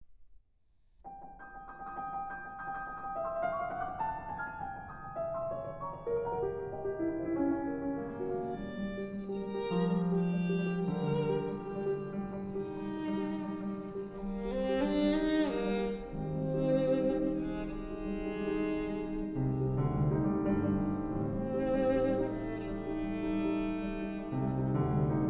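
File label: Violin Sonata in G Minor Finale Très animé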